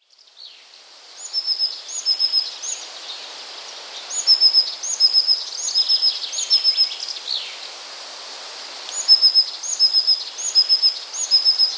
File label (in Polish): spiew